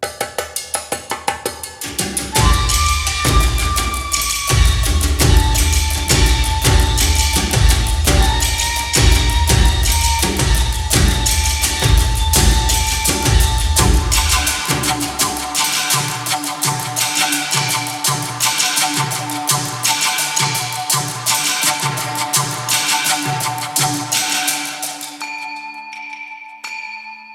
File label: Ambient Day; Eidolon; Cetus